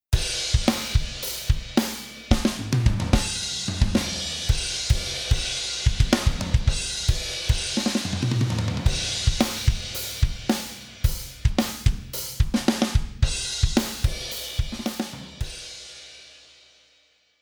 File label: Drum Test